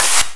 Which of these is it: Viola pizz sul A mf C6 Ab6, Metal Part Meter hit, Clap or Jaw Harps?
Clap